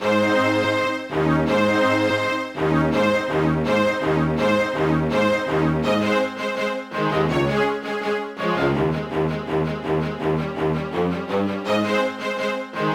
Classical Stage Karaoke; pinky street kira kira music night pop town gamerip

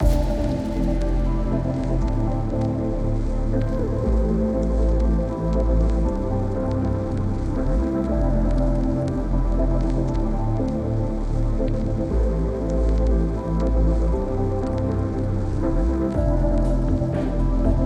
multiplayer lobby ambience; Beat Saber; PS4 Windows gamerip

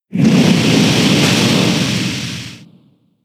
Sand Tomb turn damage; Pokemon; gen 6; XY; ORAS

